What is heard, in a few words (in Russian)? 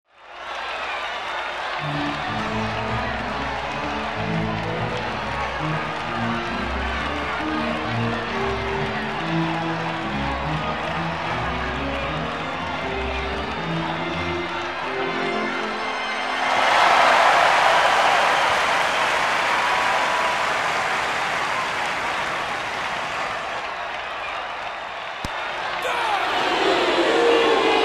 bejsbol igraet organ vozglasi bolelshikov nedovolnie vozglasi